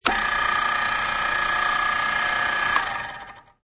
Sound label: Motor, Small industrial electrical motor. Start up, run for a short time; stop.